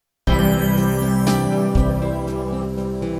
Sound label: logo ahead